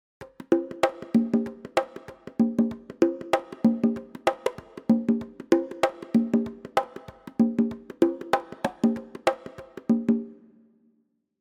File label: Percussion